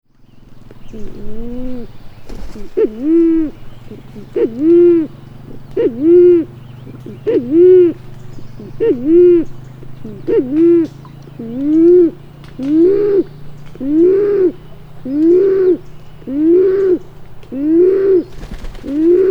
Band-tailed Pigeon

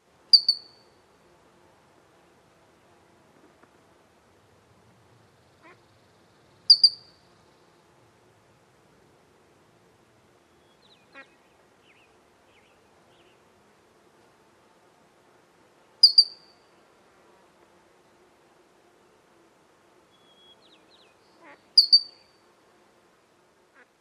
Uinta Ground Squirrel, Lake Solitude

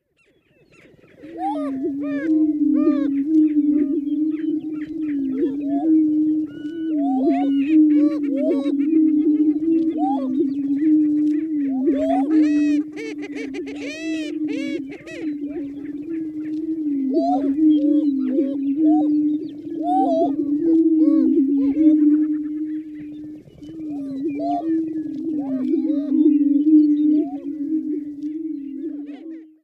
Greater Prairie-Chicken

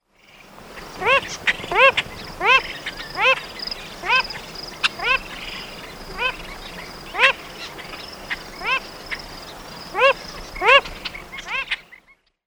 Wilson's Phalarope

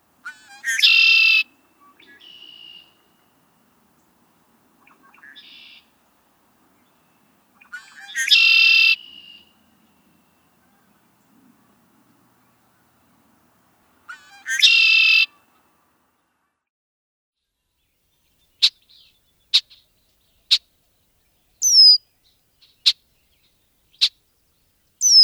Red-winged Blackbird

